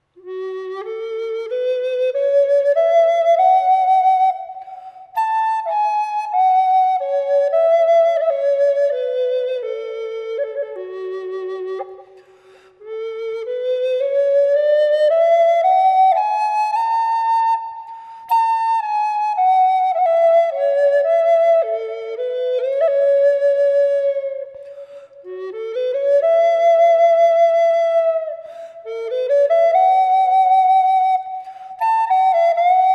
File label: otter f-sharp wet, flutes